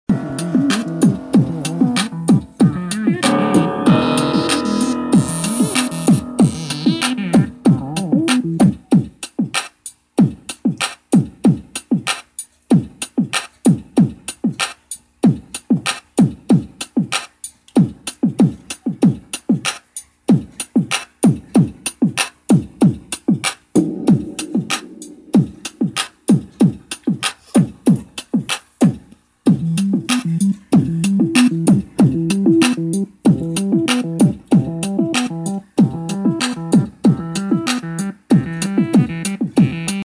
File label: music ringtone